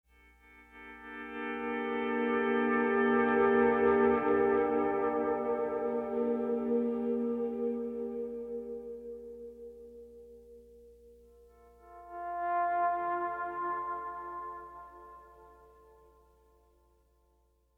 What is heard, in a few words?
Pleasant Guitar